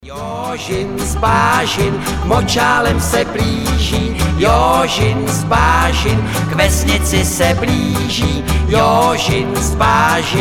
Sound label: banjo band; 70-е